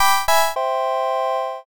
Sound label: ending